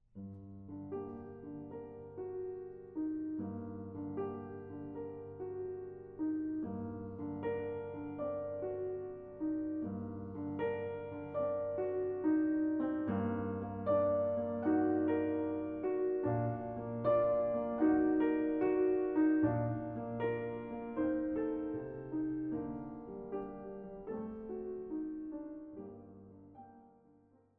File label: Barcarolle